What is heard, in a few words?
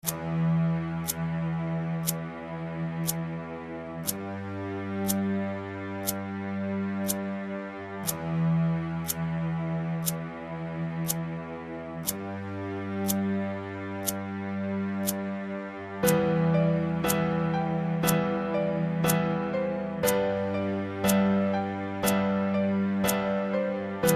instrumental